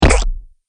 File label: Mud Splat